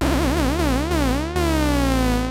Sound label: analog fx, synths; Rubber Chicken Software Sample Bank